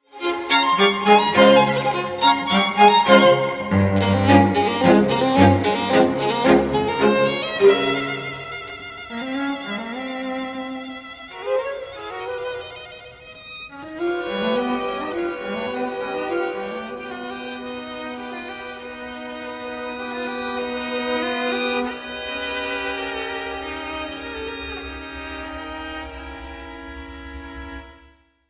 4th movement allegro energico string quartet in e minor